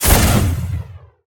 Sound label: cannon plasma shot tonal high; fvtt sonniss gdc audio